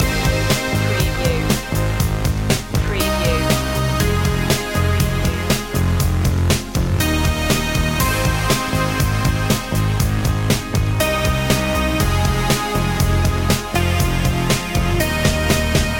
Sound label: starstruck loop